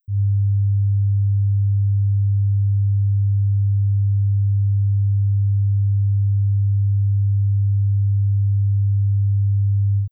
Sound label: 100Hz